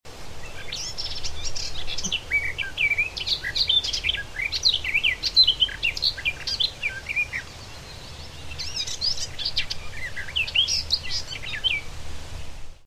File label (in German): gartengrasmuecke